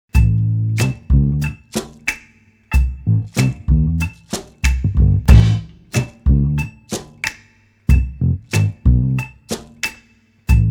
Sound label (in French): Lecture de rythmes préparée Tempo; formation musicale fin de 1er cycle 2017; examens 2017